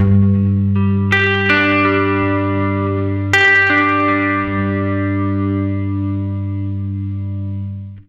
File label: 80 MAJ ARP G, Acoustic Guitar Loops; USB Soundscan vol.04 - Electric & Acoustic Guitar Loops; AKAI